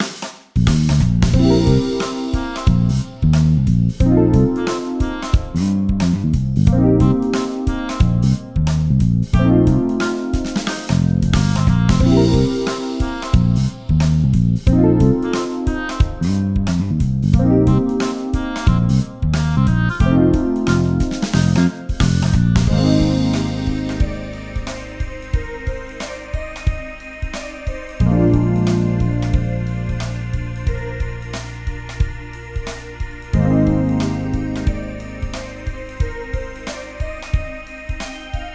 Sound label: Training Room Loop; Idol Showdown